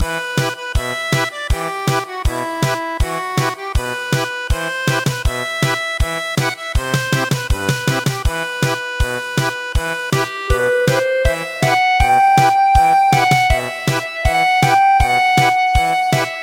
German Polka; Danchone Bushi

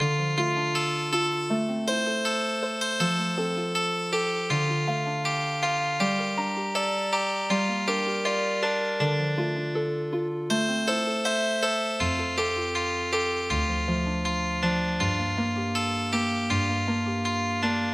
black Hair Bird Healing Harp

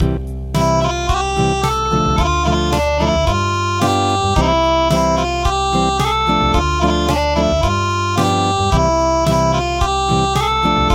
Acoustic, traditional; El virolet

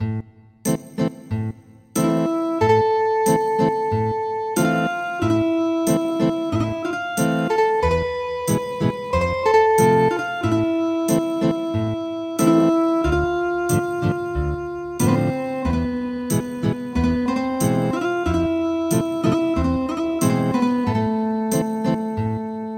Ethnic Guitar; Bubuy bulan

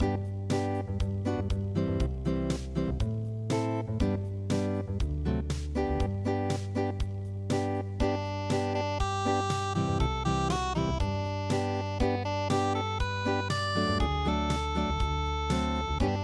hana unpluged

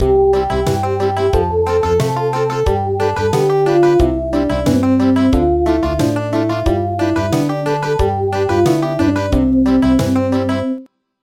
Country; Goodnight Song for Dobbin

